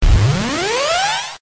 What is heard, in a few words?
GAMBLE WIN; Football